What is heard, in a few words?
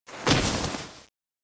body drop; svencoop; sence; mistake coop